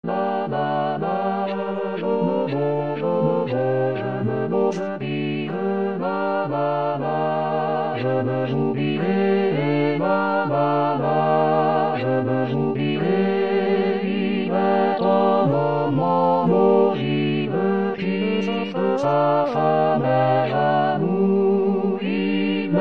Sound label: Tutti; Certon